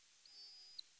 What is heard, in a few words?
lesser horseshoe, bats echo; britishbats